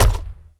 wood run

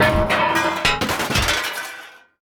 impacts; World of Tanks

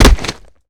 kick gun kick

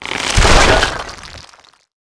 fence stone wood; World of Tanks Blitz

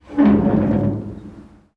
ambient; World of Tanks

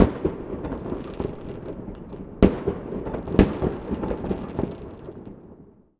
salute; World of Tanks Blitz; hangar 9 may

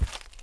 Animal Foot Large Tund; Civ4 warlords

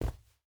footstep hide carpet jump land; Rust

